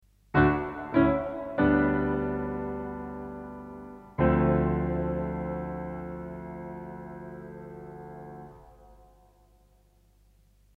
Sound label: PIANO